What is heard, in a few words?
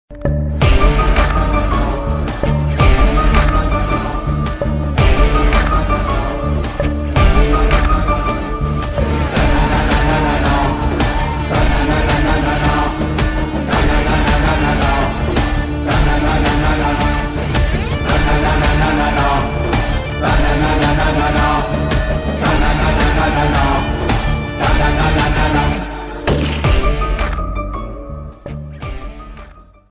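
background music ringtone; Vikram Vedha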